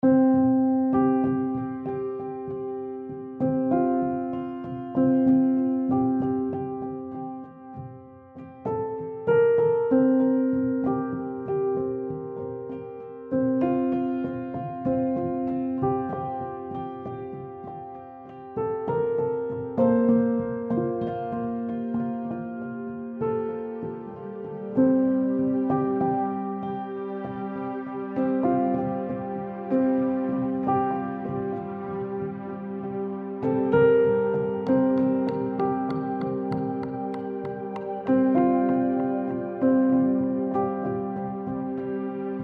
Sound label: Calm down a bit